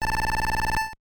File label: Telephone, nes; Pizza Pop